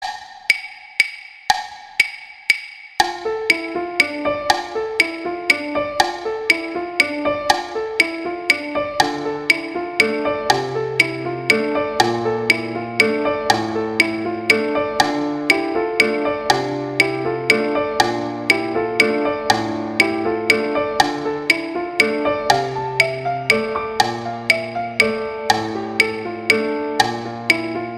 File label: Carol of the Bells; Christmas